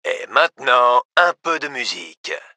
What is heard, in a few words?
music